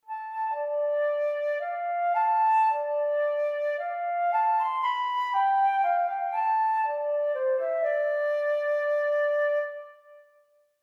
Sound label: Ocarina; Ocarina of Time